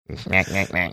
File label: making funny sounds